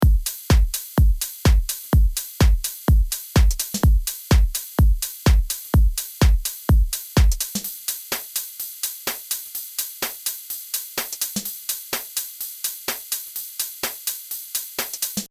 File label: Coffee Drums